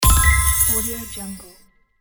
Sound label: egyptian prize